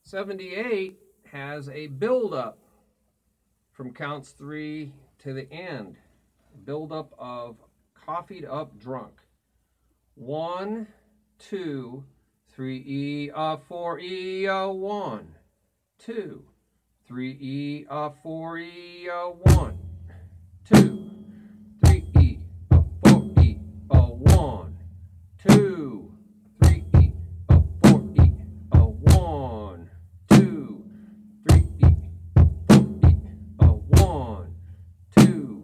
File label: Beat 1 2 3 e a 4 e a Toom Cha Toom Ba Ba Cha Ba Ba